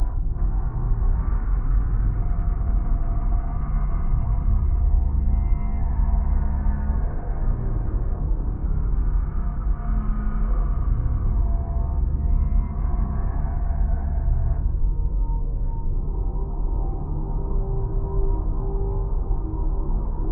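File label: ambience starbase salvager; Stellaris; overlord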